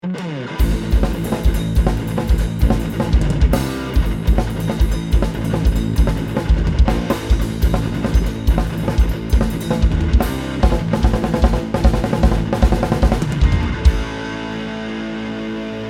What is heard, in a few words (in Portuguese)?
banda intro